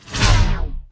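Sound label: Weapon gone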